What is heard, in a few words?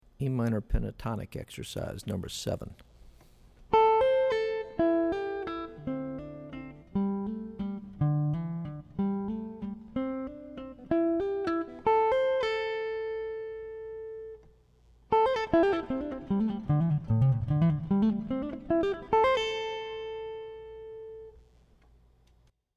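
Am Pentatonic Exercise, guitar; lead skills materials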